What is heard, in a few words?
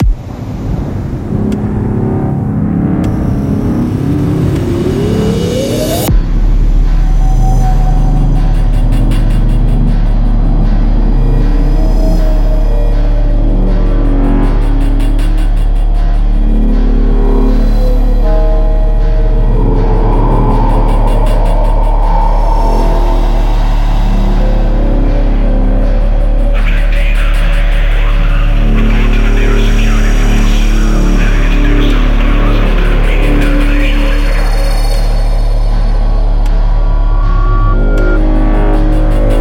Old Main Menu Theme